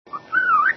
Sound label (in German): pfeife